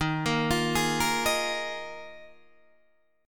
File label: Eb Suspended 2nd Suspended 4th, Guitar, Open D